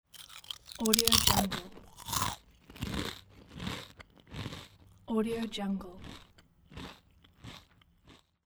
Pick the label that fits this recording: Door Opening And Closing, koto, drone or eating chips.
eating chips